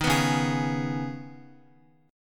C sharp-7th Suspended 2nd-C sharp-x,x,3,4,1,4-1-down-Guitar; C#7sus2; strummed; JGuitar